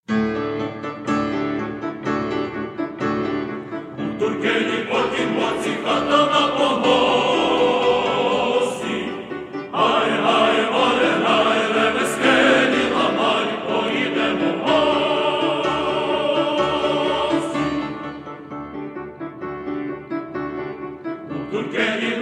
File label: instrumental